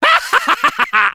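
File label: laugh happy, French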